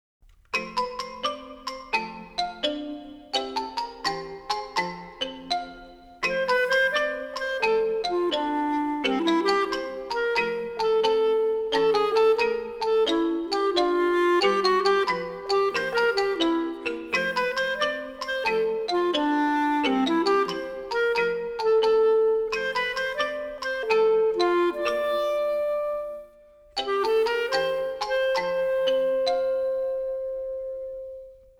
because it s spring music only

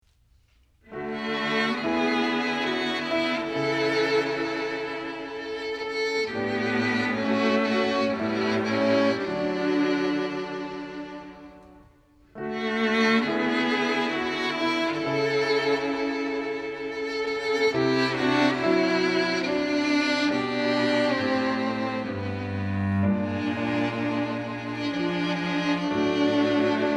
music only

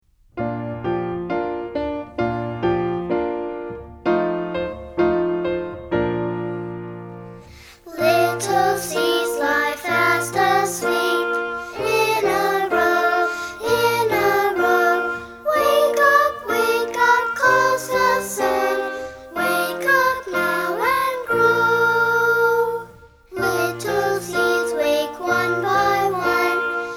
little seeds lie fast asleep words and music

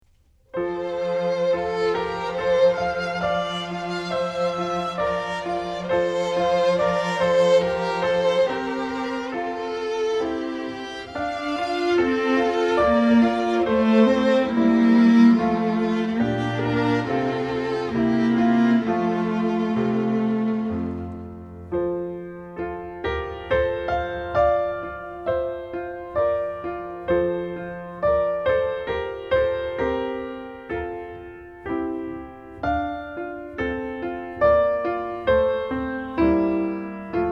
music only; air from orpheus; prelude

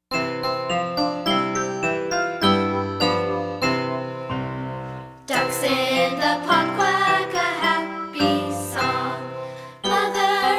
happy song words and music

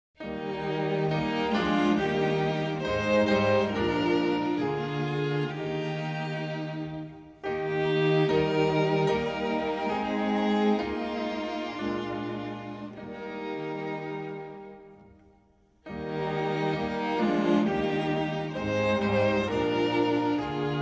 music only

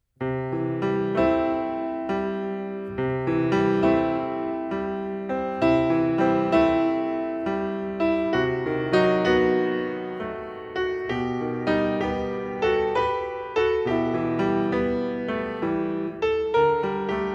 the sacred grove music only

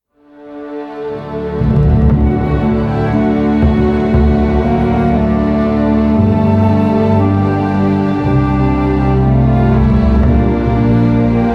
music; book of mormon videos

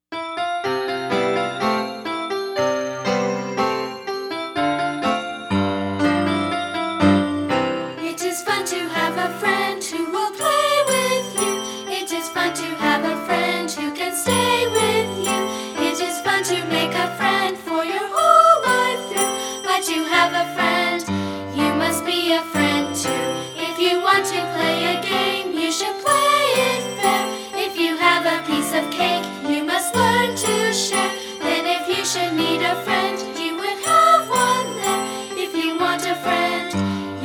friends are fun words and music

